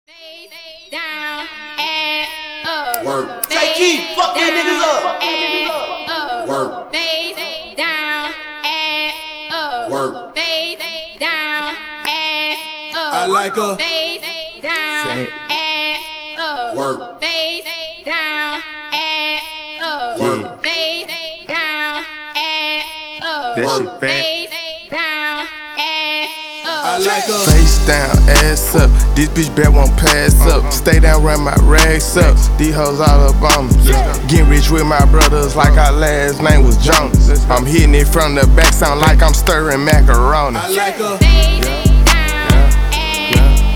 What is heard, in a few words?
acap intro